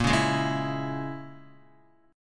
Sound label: A sharp Minor 7th Flat 5th A sharp, Guitar 4F,4A,5C,5G,5C,6E; strummed; x,1,1,1,1,0